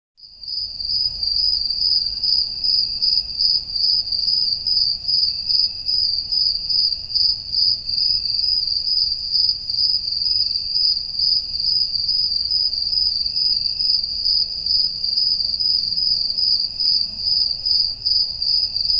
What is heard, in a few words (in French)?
Grillons, Animaux; bruitage